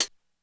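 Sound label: Hihat Closed, Korg DSS-1 Drums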